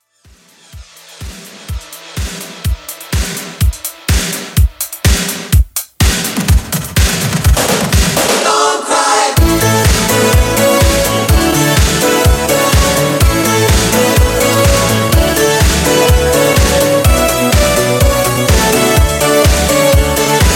80s Extended Mix 125Bpm

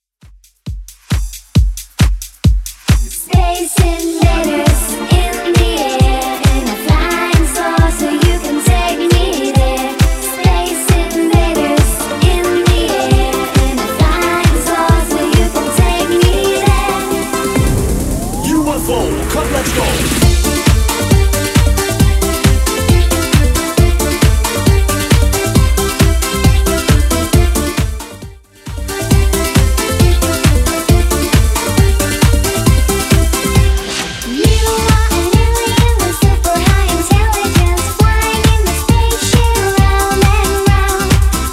90s Redrum 135bpm

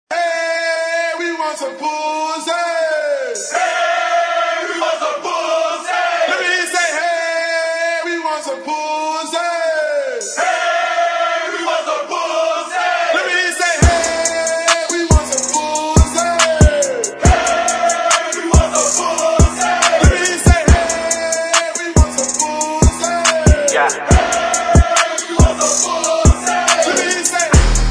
Twerk Acap In

